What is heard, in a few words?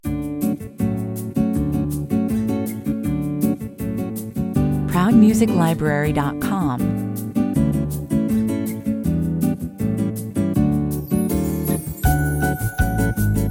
jingle bossa bells